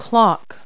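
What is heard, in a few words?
wall clock; Multi-Lingual Talking Picture Dictionary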